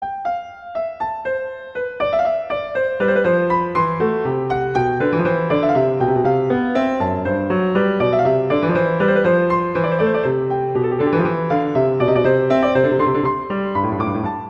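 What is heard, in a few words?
Sonata Allegro, Piano; Telemann; Canonic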